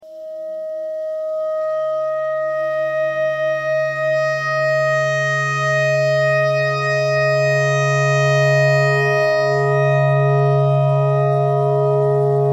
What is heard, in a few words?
clarinet clarinet notes e thread pentatonic